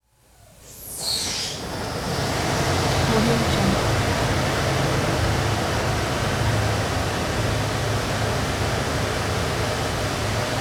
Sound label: arresting cable; prosoundfx